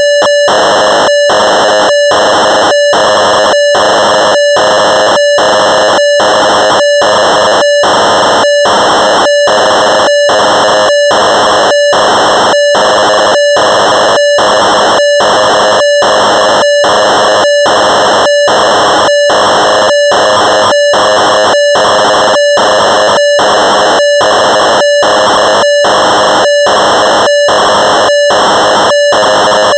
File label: Rhodes Chroma factory presets, Cassette Interface; Synthesizer Factory Patches